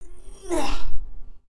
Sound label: Mod Rebuild Grunt; dialog